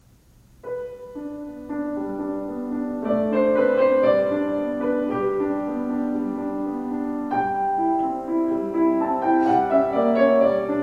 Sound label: Andante from Piano